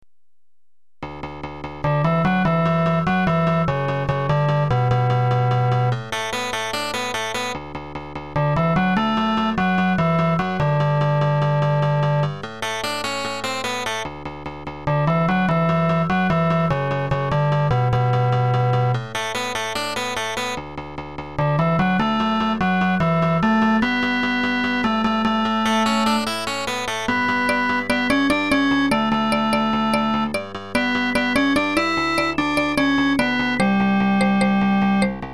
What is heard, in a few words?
bgm